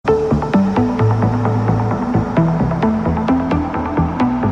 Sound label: Euphoric Trance